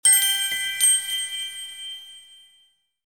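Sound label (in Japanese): kirakira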